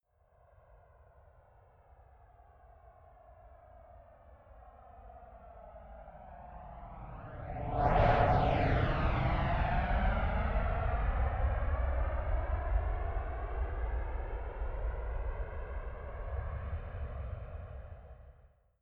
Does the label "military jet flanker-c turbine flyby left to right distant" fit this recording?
Yes